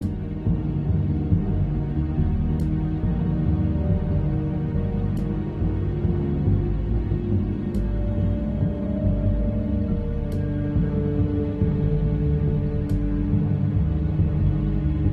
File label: loop Suspense